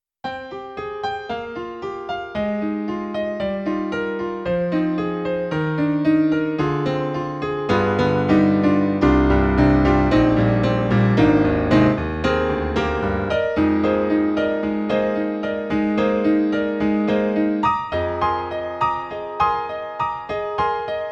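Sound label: Perfect Piano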